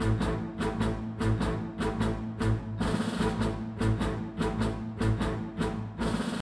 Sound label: MUSIC MENU LOAD